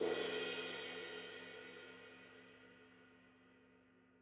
N CRASH LOW CYMBAL, drum; Sonimus; n series drum pack

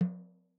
SNARE OFF LOW, drum; t series drum pack

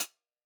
SHORT CLOSED HI HAT, drum; Sonimus; s series drum pack; STONEQ 4K; SATSON; SATSON CS

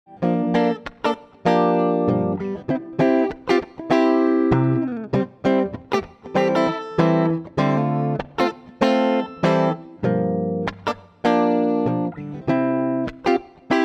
Funky GTR; Sonimus; SatsonCS

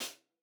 HI HAT SHORT SHORT HI HAT; Sonimus; n series drum pack

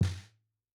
LOW SNARE, drum; snare 3; Sonimus; s series drum pack; STONEQ 4K; SATSON; SATSON CS

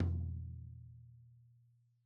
TOM LOW, LOW TOM, drum; Sonimus; n series drum pack

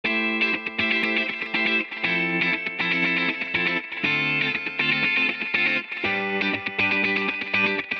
Delay FX ON Electric Guitar; DelaySon

